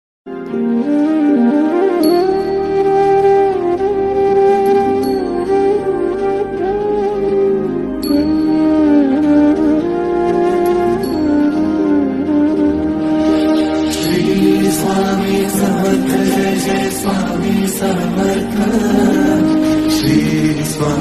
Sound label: Shree Swami Samarth ringtone Instrumental; devotional ringtones